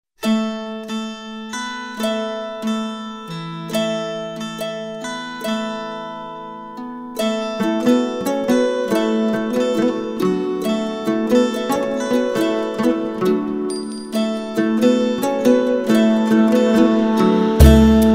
backing track

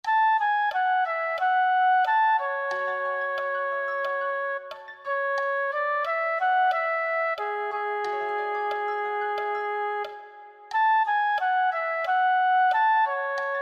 kisna theme 1 accompaniment

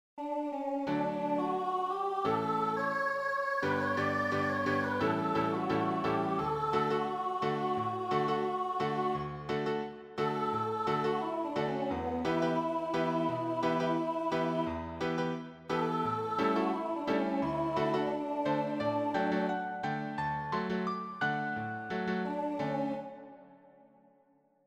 accompaniment, piano

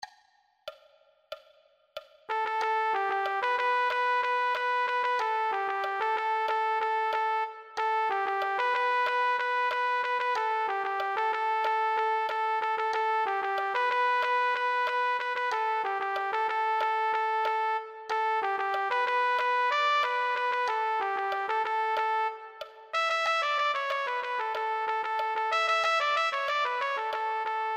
trumpet

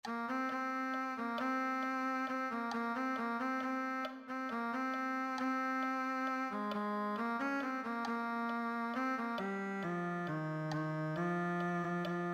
cello

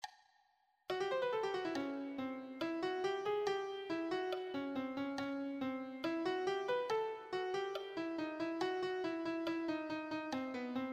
melody

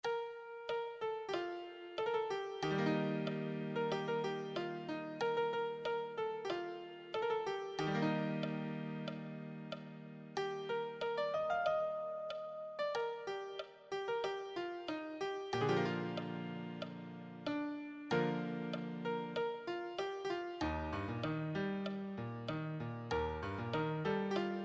solo, piano

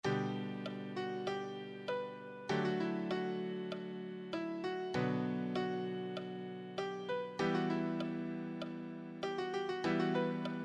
solo, piano

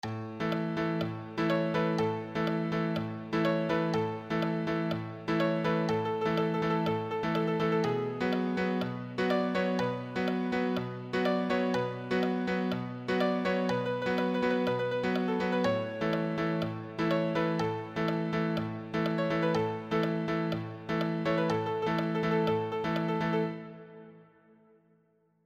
solo, piano

